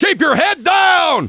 live fire; drill

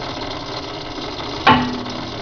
strech move; svencoop; hunger

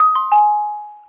front left, surround; svencoop

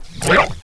pit drone attack spike; svencoop

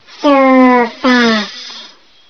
alert; hunger; babyslave

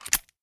Mk2 pin pull; svencoop; hunger; weapons

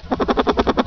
chicken die; svencoop; hunger

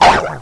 pit drone pain; svencoop

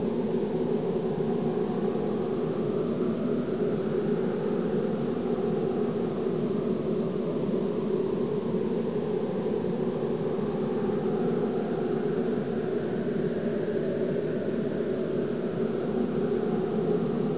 wind; svencoop; hunger; thambs

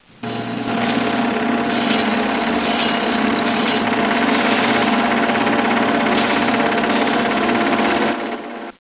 projector; svencoop; hunger; thambs

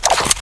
beretta twirl

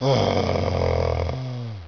pain; svencoop; hunger; hgrunt

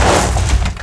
train brake; svencoop; hunger; hungerplats

